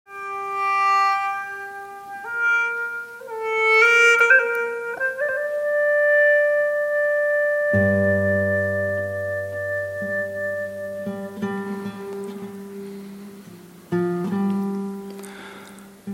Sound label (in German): hulusi solo, live; schwarzenberg; radiomitschnitt